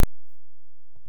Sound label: microphone power on pop; lenovo thinkpad t14